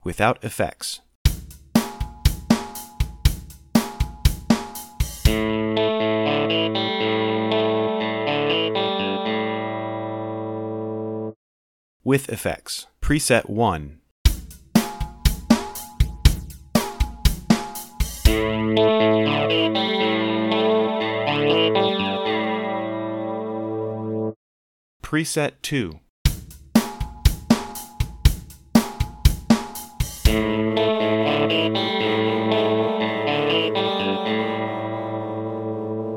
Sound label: chorus; Mixcraft; acoustica